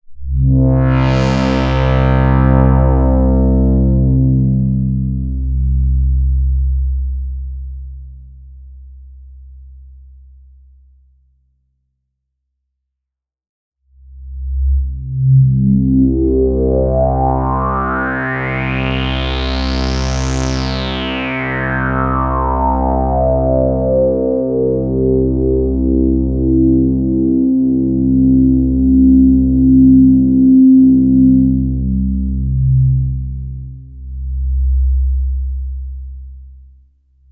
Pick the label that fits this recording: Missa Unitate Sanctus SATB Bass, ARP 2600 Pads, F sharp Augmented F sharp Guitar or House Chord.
ARP 2600 Pads